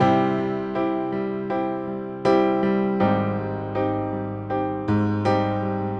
70s Ballad Piano

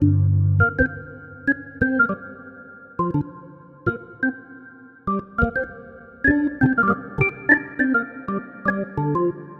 Blues Organ Hammond G 100

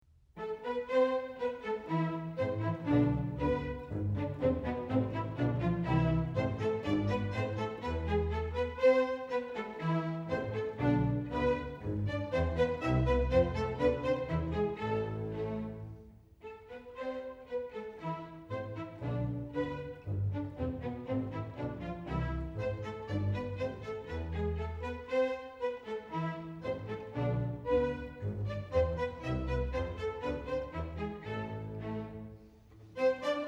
Symphony N3 C major Vivace; classical; moody; Boyce

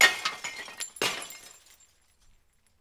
glass, breaking

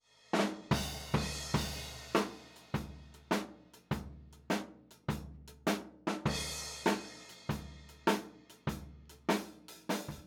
Tom

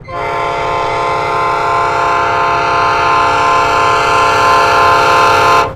TRAIN diesel Horn

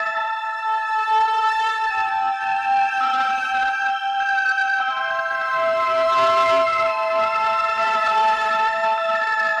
Low Tide 100 C Pad, Ambient Piano Loops; C and Dm Night Mood

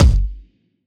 Kick, Interesting Drums

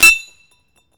BANG, explosions gunshots

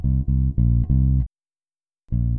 Blues C Bass One Bar 100bpm; Blues-loops-no-key-change

